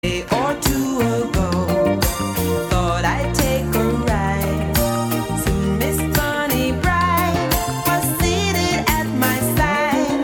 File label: Jingle Bells